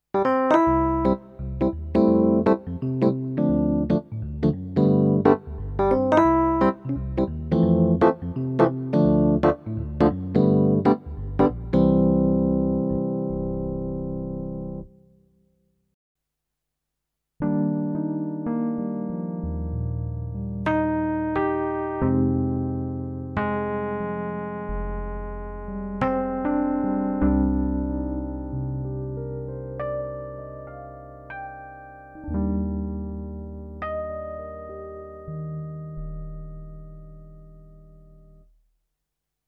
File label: PA600 EPiano